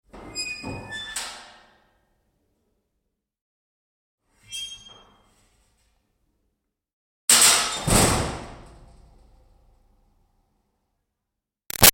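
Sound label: Door Metal Milking Vat Screech Open-Close; Hollow; Doors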